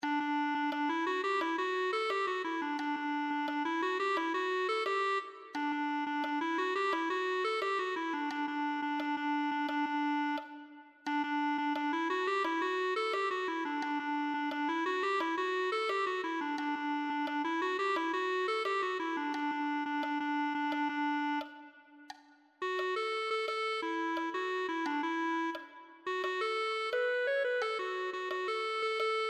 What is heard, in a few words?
clarinet